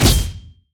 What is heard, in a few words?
riposte; LoL; fiora